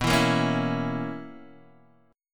B Major 7th Suspended 2nd Suspended 4th B Guitar Open G